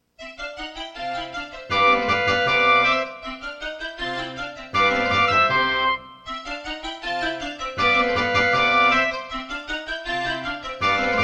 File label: Concerto partial, Serdaco Dreamblaster S2; classical; Mozart; Concerto K467 partial